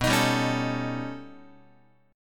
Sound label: B Augmented 9th B Guitar, chord